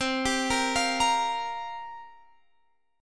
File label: C 7th Suspended 4th, Guitar, Standard; arpeggiated; capo 9